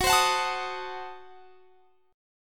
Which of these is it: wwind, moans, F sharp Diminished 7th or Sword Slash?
F sharp Diminished 7th